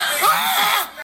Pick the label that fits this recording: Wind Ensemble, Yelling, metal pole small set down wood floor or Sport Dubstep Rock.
Yelling